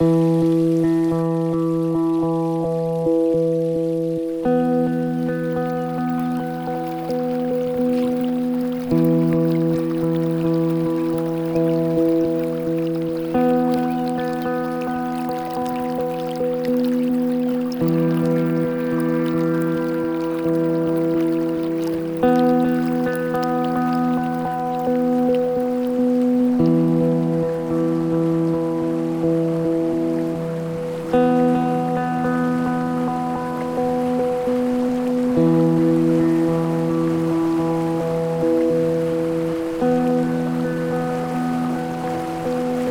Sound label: Arterial Loop